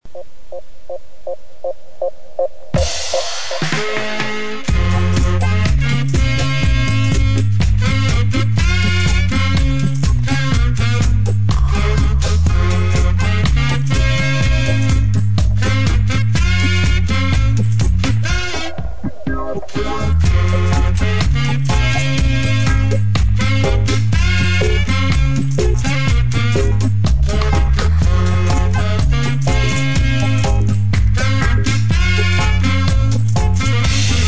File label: sax version